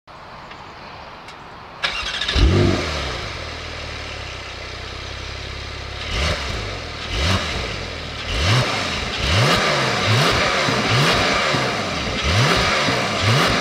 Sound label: Porsche 992 EXHAUST Sound